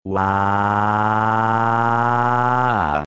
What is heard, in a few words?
crying